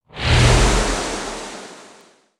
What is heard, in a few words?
Flyby Clean